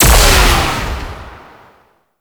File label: Light Impact; Warframe; Eximus; Jade Light